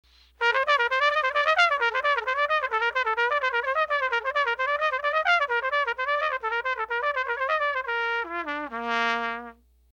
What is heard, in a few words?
articulation c